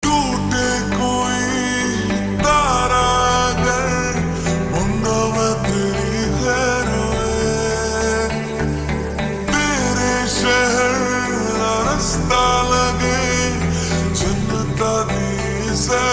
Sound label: Ringtone slowed reverb